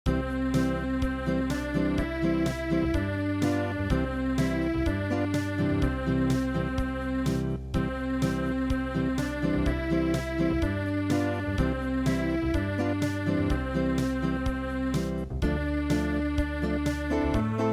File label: Unplugged